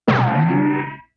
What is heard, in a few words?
boink; Crazy Paint: On Location